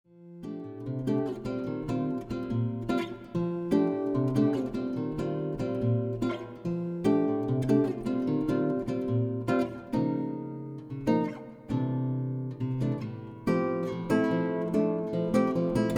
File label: Guitar Piece